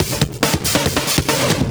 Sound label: Loops Fills; Vengeance Samples